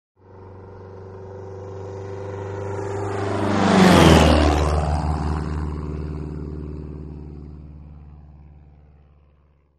Taylorcraft: By Low; Steady, Medium-speed Engine With Air Whistling. Extreme Doppler Effect With Flyby. Medium To Distant Perspective. Prop Plane.